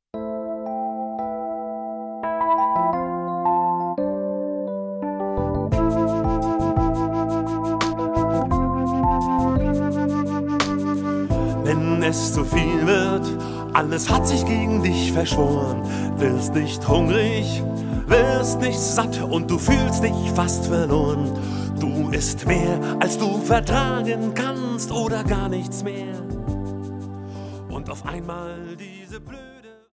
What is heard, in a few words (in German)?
luftgitarre